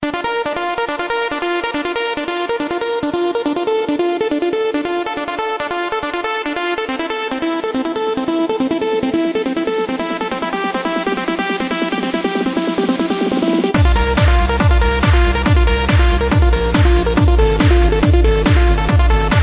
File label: Oldschool Trancing